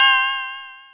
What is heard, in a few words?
Multi FX, Drum Machines, E-MU Pro-Cussion